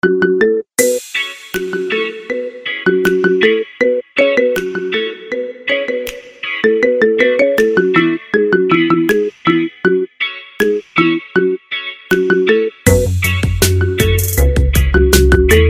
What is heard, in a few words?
iPhone Instrumental